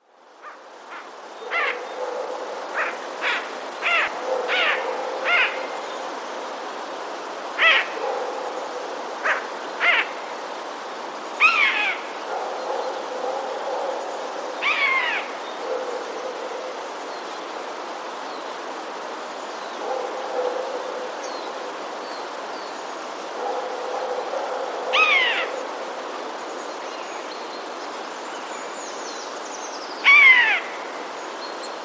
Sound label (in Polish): sojka; głos myszołowa i krótkie okrzyki